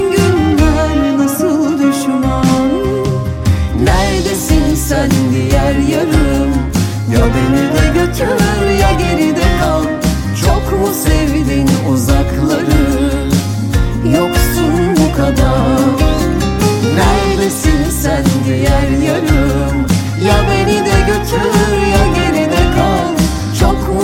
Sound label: Ringtone Mobile